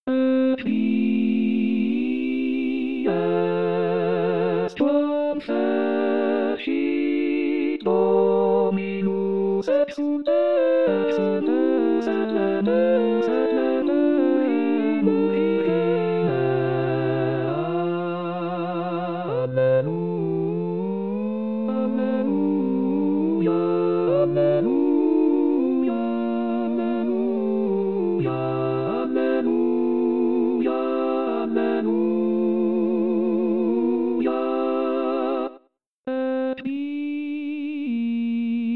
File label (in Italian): Haec Dies Coro; pasqua